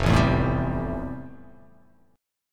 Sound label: F Major 9th F; FM9 strummed; JGuitar